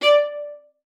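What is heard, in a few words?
D5, viola spiccato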